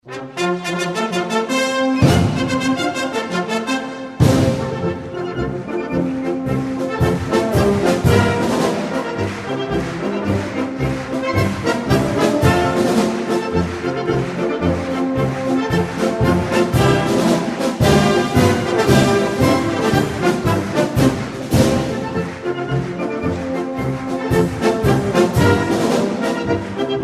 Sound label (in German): marsch